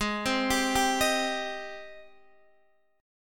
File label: Ab Major 7th Ab down Guitar DADGAD